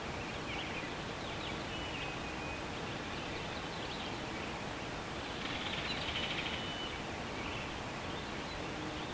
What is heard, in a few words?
AMB; Winnie the Pooh Toddler